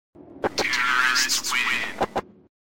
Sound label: win; cave